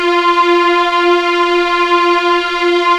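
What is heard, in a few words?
F4 legato F R, string; East West; The Ultimate String Collection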